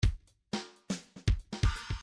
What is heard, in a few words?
midi file grooves funk